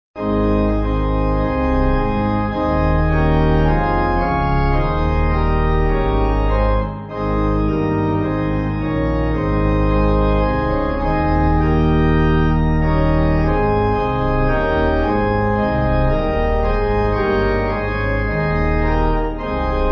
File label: Pipe organ; The Lords My Shepherd; Wiltshire